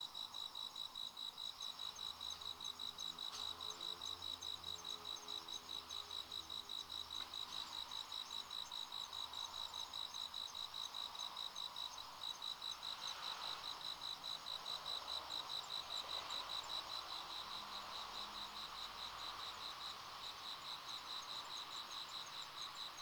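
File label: Emma field cricket; tuduresasekoorogi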